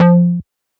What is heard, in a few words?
Synth Note Simple Analogue F